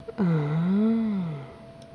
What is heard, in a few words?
ahh; Nokama